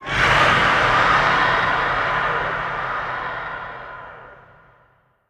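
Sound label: front left, antlion distant scream; creatures; Model Pack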